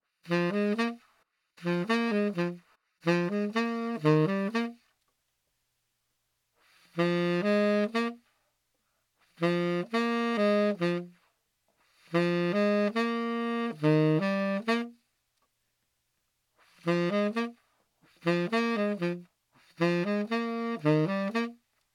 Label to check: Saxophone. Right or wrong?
Right